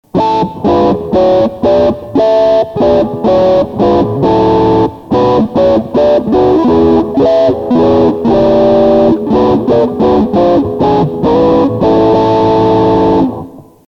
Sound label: Normal Block, guitar sounds